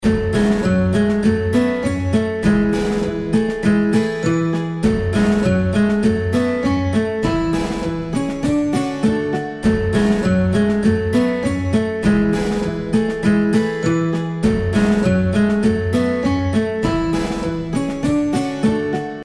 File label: intro bgm; California Gold Rush